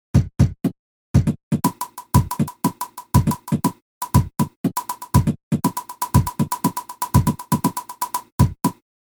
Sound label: Human Batucada